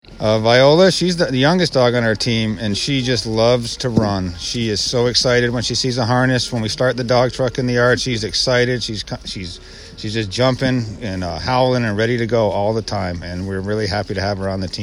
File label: Viola